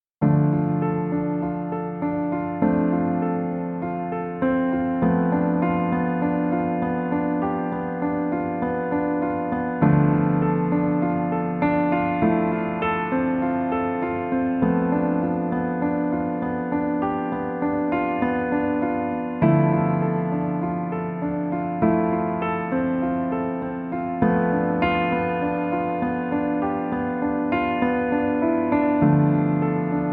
piano

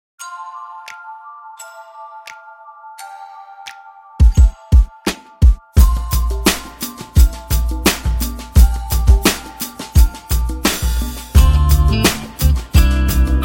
Snappy Clappy

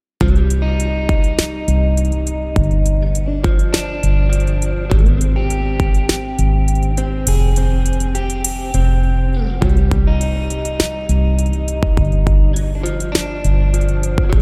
guitar drums

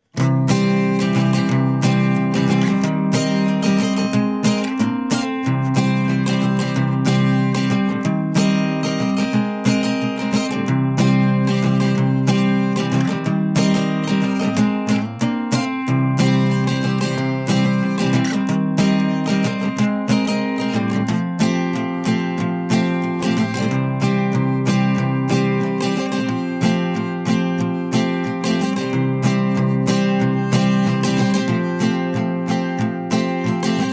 only guitar

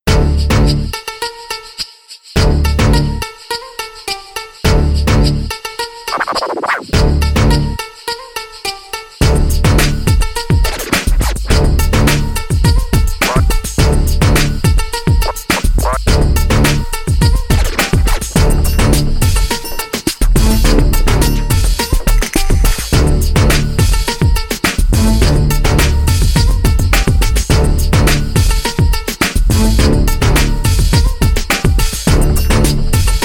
gangsta rythm

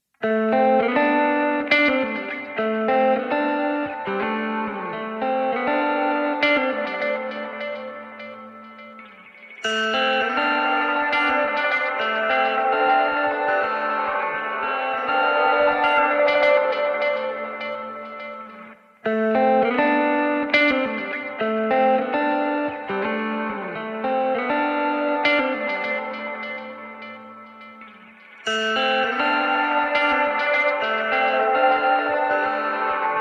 guitar

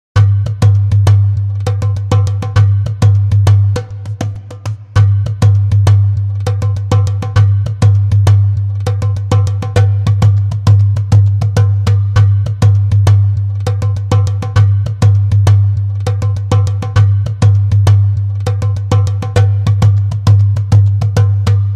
perc

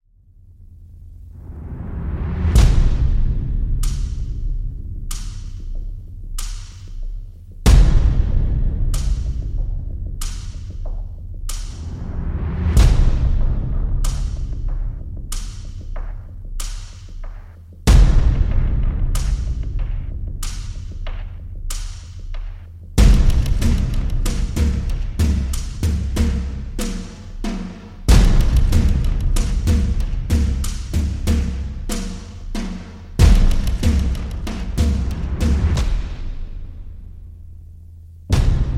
drums

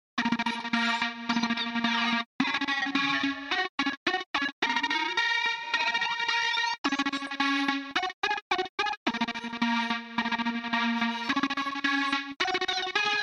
funny gimmick

